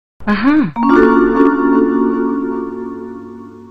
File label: ahuh; Tomb raider